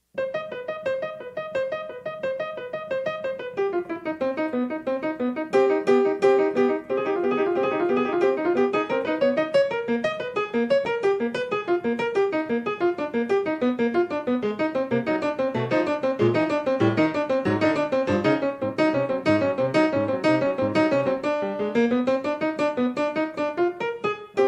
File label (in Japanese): ピアノ; ２人のおかしなおばさんが、けんかをしました。; ハチャトゥリャン 作曲; 発表会